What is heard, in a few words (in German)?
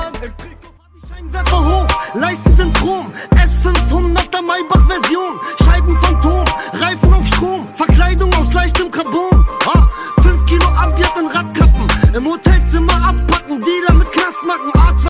deutsche rington